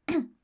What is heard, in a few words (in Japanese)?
sekibarai; serifu others